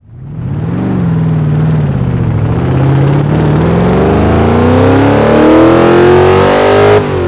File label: first, vehicles; gmod; tdmcars; stingray